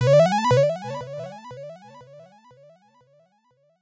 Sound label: sound block